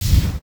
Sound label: smoke out; Mr.-potato